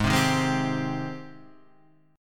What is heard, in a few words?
Ab Major 7th Ab down Guitar Half Step Down; AbM7